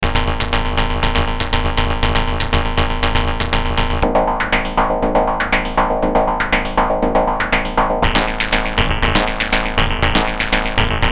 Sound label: beats